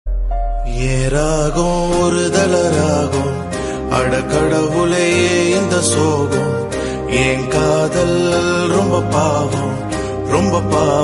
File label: tamil love song ringtone